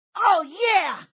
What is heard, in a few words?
ah-yeah; Rabbit Rivalry